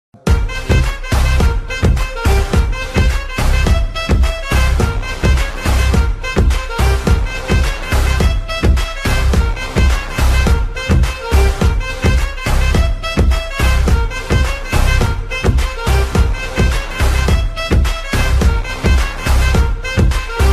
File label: music ringtone; Swag movie